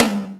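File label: low tom; MPC-60 DRUM2; Greytsounds VOL-1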